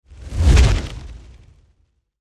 Fireball Catapult, Fire Catapult Whoosh Pass By Heavy; Flames; Sound Design; Flame Sound Effects